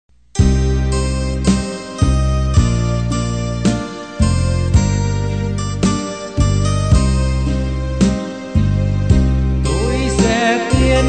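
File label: soprano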